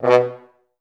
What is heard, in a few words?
FH STAC B1; Best Service; Peter Siedlaczek's Advanced Orchestra